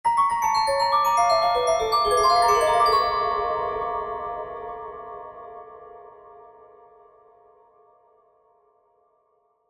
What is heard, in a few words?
Piano Dark Eerie, Piano Dark Eerie Glockenspiel; Musical; Blastwave